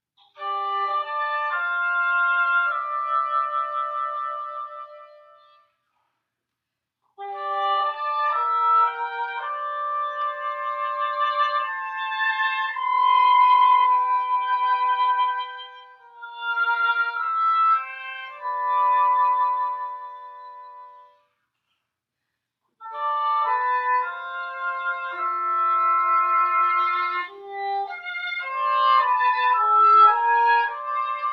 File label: Repair Oboe Eng Hn excerpt